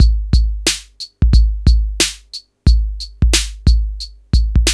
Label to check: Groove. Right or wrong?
Right